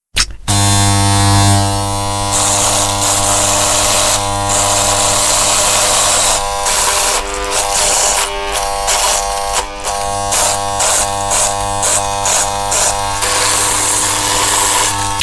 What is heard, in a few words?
Hair Clipper